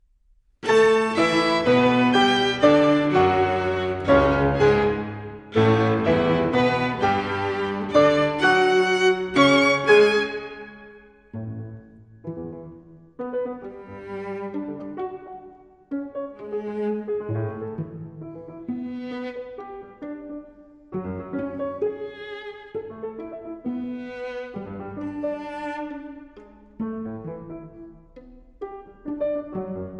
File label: Piano Trio - Allegro Energico